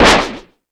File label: Percussion FX, Drum Machines